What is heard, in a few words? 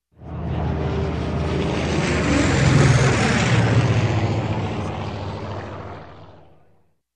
Old Big Propeller Aircraft passing by